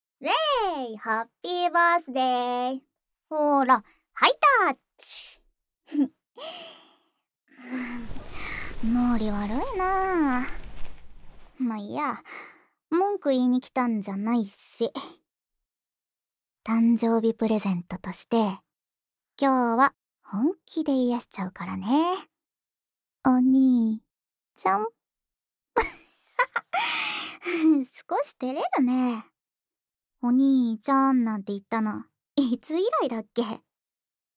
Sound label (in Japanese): 甘々 耳舐め (左右耳), ASMR